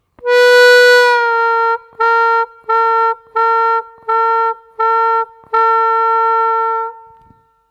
научитесь отрывисто играть бенд -3′ на третьем отверстии, губной гармошки

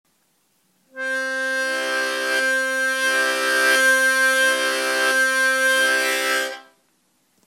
+(1 4) +(1 2 3 4), губной гармошке; октаву +(1 4); несколько раз оторвать язык от блокируемых отверстий и приставить его снова; техники блокировки языком

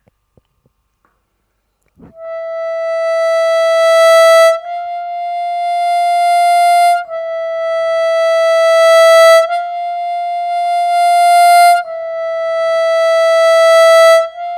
+5-5, губной гармошке; одиночными нотами

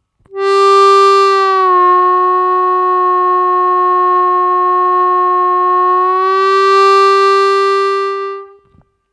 Играть бенд на полтона вниз на втором отверстии -2′, -2-2′-2 плавно, губной гармошки

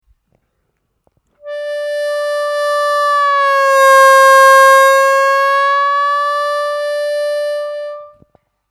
Бенд на четвёртом отверстии, губной гармошке; На четвертом отверстии плавно понижать ноту до самого дна, возвращаясь затем на чистую ноту

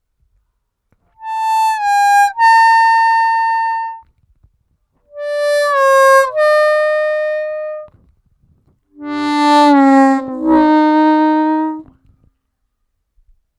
6-6’+6o / -4-4’+4o / -1-1’+1o, овербенды, губной гармошке

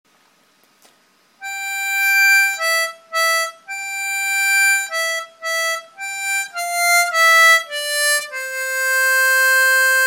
губной гармошке

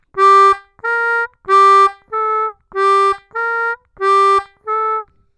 отрывисто, губной гармошки; 2 -3’-2 -3’'

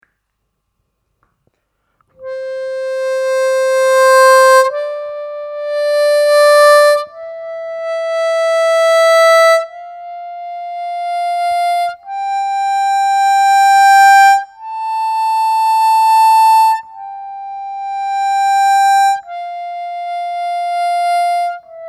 +4-4+5-5+6-6, губной гармошке; одиночными нотами

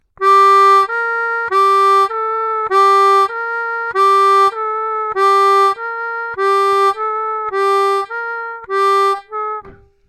2 -3’-2 -3’' плавно, губной гармошки

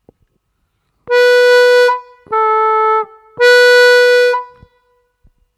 3-3''-3 отрывисто, губной гармошки

